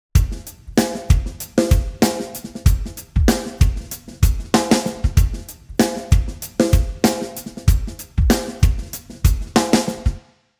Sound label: drums; digidesign pro tools xform; timestretch